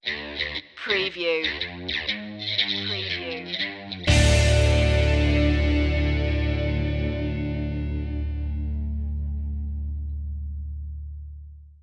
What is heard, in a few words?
rough up stinger